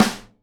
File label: SNARE DAMP, Live Snares; PlatinumPhattCD1; E-MU Producer Series Vol. 8 – Platinum Phatt